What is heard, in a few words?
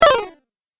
8 Bit Style Fall; Video Game Sound Effects